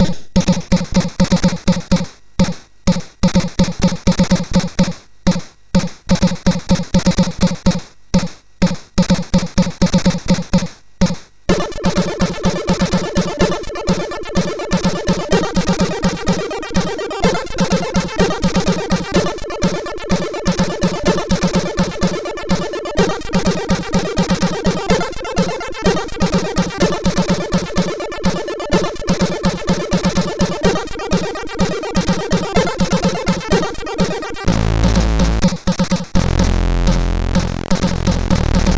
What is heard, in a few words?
Echo Drum, MOS6581R3